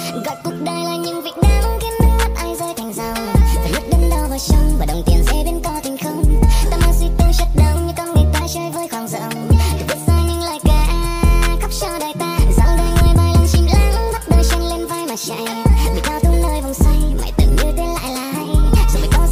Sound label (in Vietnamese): Nhac Chuong Speed Up